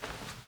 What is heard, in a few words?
fs carpet medium; stanley parable